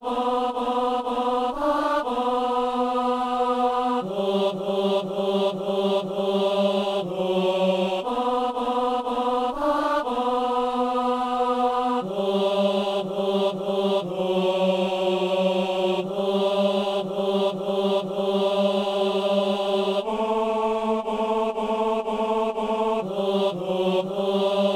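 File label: tenor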